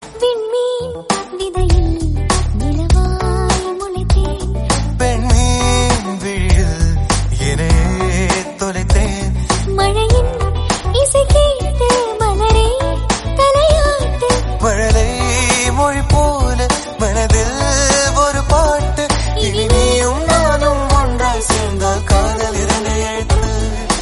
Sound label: female